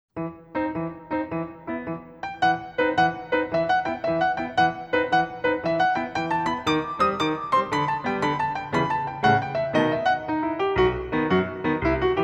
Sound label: Warm up jumps